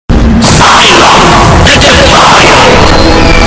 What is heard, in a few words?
screams; variks; sylok the defiled